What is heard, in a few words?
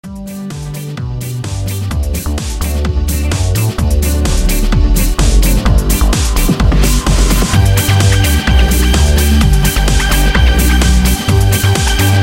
Patter